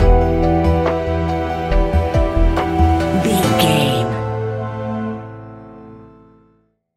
spectral lullaby stinger, A relaxed and chilling piece of music